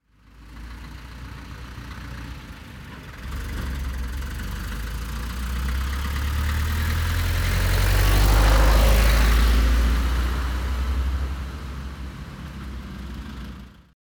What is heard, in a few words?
tractor driving